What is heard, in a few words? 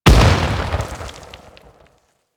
Teralyst Close Step; Enemies; Steps; Warframe; Eidolon